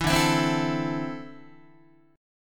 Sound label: D 7th Suspended 2nd down Guitar; D7sus2 strummed